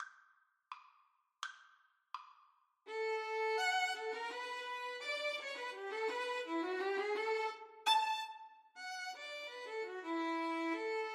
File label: Violin duet; A Syncopated Two-Step